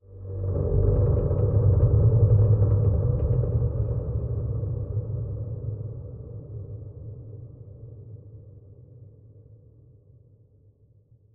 Sideways Town Metal Creak; Boomtown; Titanfall 2

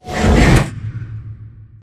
pod door ext verb close slide; Titanfall 2

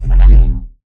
Movement Jog; Titanfall 2

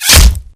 bullet light ballistic flesh; Titanfall 2

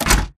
Mvmt FS Walk Default; AI Stalker; Titanfall 2

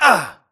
grunt hits; Titanfall 2

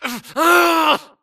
grunt Struggle; Titanfall 2

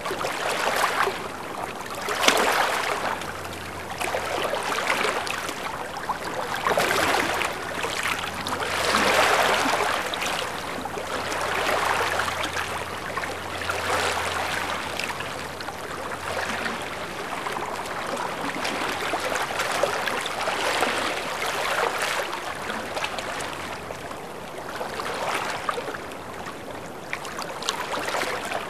amb lagoon water lap; Titanfall 2

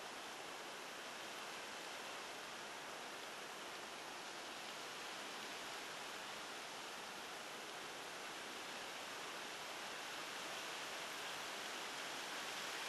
Tree Breeze; Blackwater; Titanfall 2

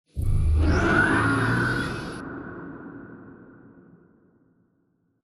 Giant Floor Panel Exit On Track Start; Boomtown; Sideways Town; Titanfall 2

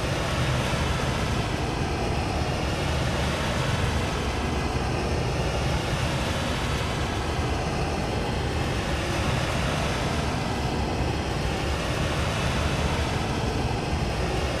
vent fan ventilation; Titanfall 2

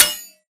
bullet light ballistic blades deflection

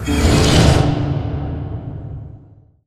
sliding door lg verb close slide; Titanfall 2

